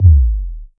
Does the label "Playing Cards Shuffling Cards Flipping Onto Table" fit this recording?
No